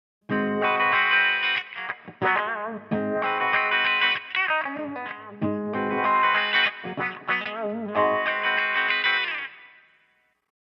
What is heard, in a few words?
filter wah